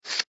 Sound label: card; CasinoCardsMemory